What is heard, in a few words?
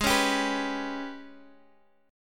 Ab Minor Major 7th Double Flat 5th Ab, Guitar Drop D; strummed; 18,16,17,16,x,x; JGuitar